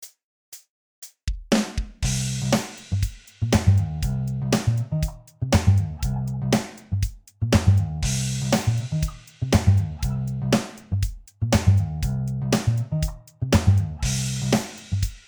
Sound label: drums and bass; peak rider 2 fx plugin; Impact Soundworks